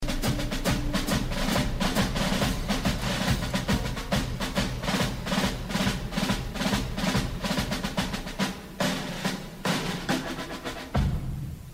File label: jazz